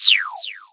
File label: silly echo zap